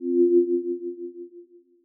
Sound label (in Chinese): 扫脸